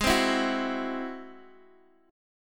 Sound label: G sharp Augmented 7th, Guitar, Open D; x,11,10,10,9,x